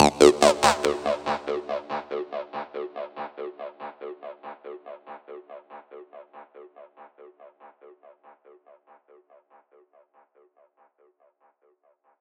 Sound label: Combear D 142 BPM, Synths